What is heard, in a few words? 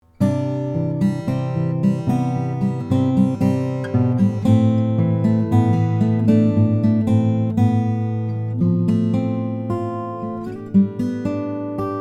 lowden f50c honduran rosewood